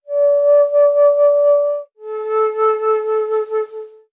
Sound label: flute, Jazzmatazz loops